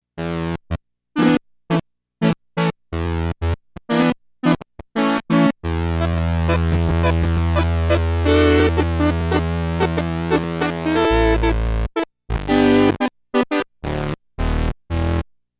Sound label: Comp Synth